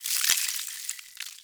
Venus Landscape Ice Slide Small, Ambience; Warframe; Venus Open World

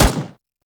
Turret First Shot; weapons; Lasrian APC; Warframe

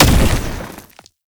Hit; Warframe; Uriel; Infernalis

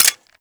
Rifle Mech, Weapons; Warframe; Zariman Grn Wraith Rifle

